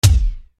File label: FU KICK GT, Yamaha RY-30